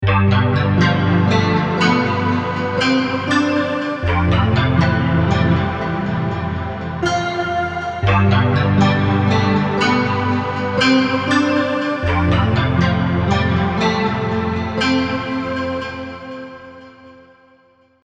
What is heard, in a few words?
neon reverb guitar